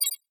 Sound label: Primary Click